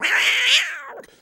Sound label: Cat Angry Squeal